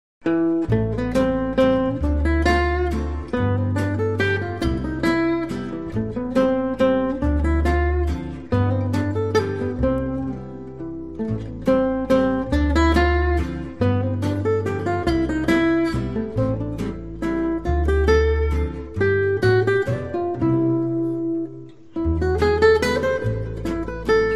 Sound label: waltz in a minor